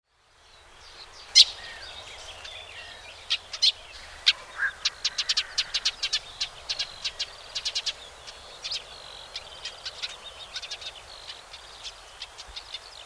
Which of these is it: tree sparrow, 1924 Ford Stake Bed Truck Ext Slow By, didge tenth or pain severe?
tree sparrow